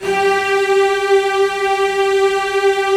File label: STR, Roland LA Composer; Roland - LA Composer vol. 4